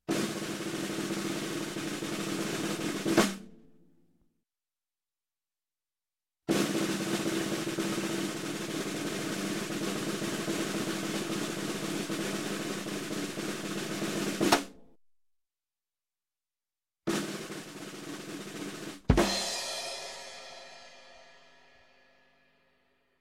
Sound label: Drums roll, Music, Percussion; Sound Ideas 2000 Series